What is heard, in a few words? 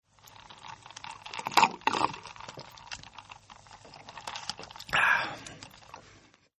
Drinking; Human; Partners In Rhyme